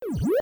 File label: eating fruit; pacman